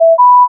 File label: Friend Online